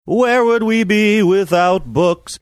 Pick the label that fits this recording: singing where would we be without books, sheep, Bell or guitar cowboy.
singing where would we be without books